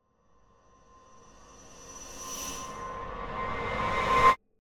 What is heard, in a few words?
Whoosh Reversed Cymbal Approaching - Higher; Hollywood Edge; sound designer's toolkit v2